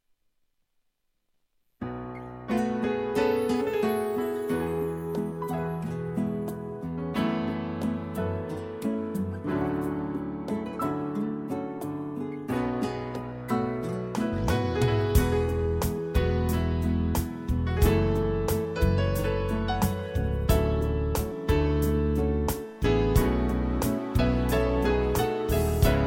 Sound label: tyros2 Modern Country